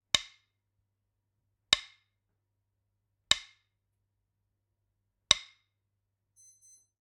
Generic Click Wood Small

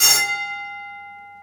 tram bell; best service Blue Box

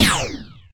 Assault Rifle Shot Loop End; Weapons

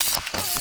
Gun Reload Scifi, Weapons Foley; SFX Kit

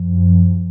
Scifi Hover Sentry Loop; SFX Kit; Sci-Fi Loops

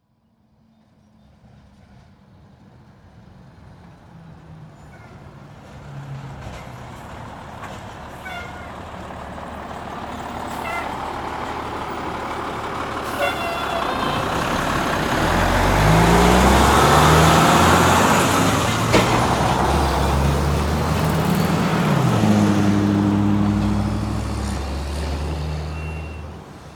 Semi Truck Pass By; Large Vehicles; Blastwave

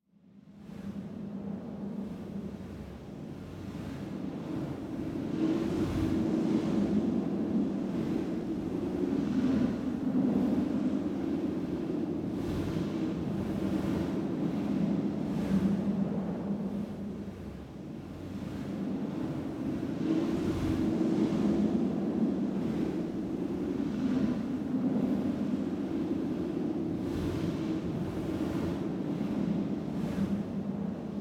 Wind Moaning Ambience, Some Rustling; Hollywood Edge; sound designer's toolkit v2

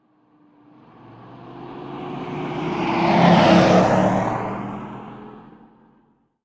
truck; Blind Drive

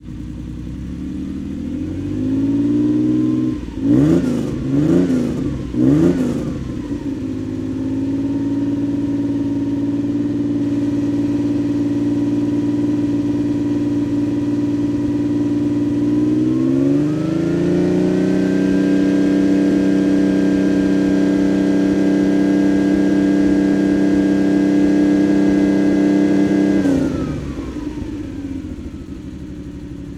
Ferrari Dino Fast Idle, Automobiles

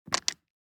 DICE on Neoprene, Grab, Standard, 6 Six Dice; Samples; ArticulatedSounds; DICE Sfx Party (Extended)

